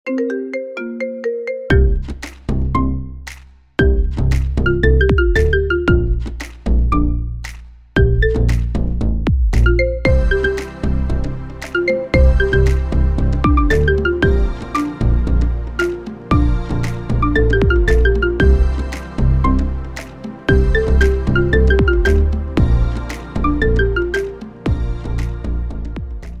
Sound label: Marimba Remix